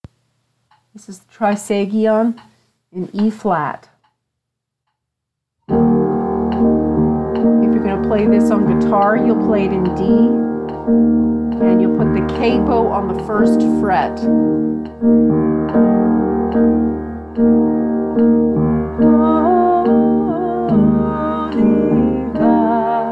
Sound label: Trisagion key Eb